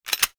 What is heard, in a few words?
pistol reload